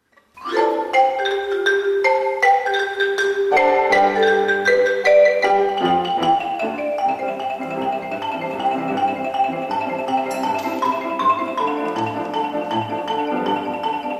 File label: Xylophone